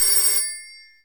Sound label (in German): Telefon